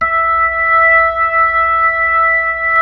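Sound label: B-3 Club crossfade, Keys of the 60s and 70s; Roland LCDP10 Keys of the 60s and 70s 2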